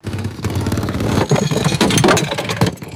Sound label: heavy motorcycle dropping onto concrete with rattling; metal